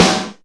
Reverb Kick Snare; PS-37 Dance Synths; Percussion

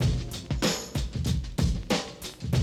Classic DrumLoops

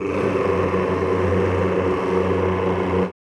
CHO Rrrr F#1, Roland; Sound & Vision; Gigapack I CD 2; CHO Mmhh + Rrrr

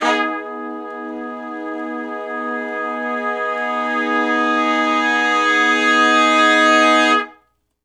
2 measure Long Note w Swell (Ab) minor, Horncraft; Crimson Blue and Fabulous Horncraft 4 RnB; Sections

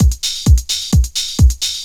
straight, Techno Trance Essentials drum loops; Ueberschall

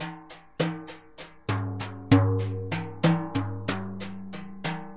Synclavier Percussion, World & Orchestral; Synclavier Percussion 2; ILIO

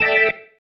Stab, Organ, One Shots

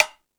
Percussion

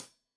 Hat Tight, Hi-Hat, Cymbals; Deeply Sampled Drums

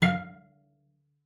F5, CELLO FINGER; Xsample VOL-5

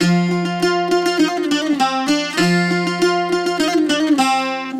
bouzouki

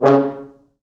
FrHorns stac

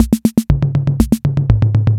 INTROS FILL, DRUMS PERC YAMAHA

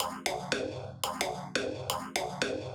Stab Loops; neuro; Optiv & BTK

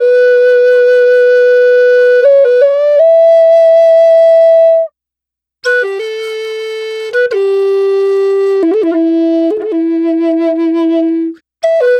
Native Flute, Native American; Sonic Foundry (Sony Creative Software) - Continental Drift Aftershocks

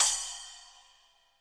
SPLSH, SPLASHES

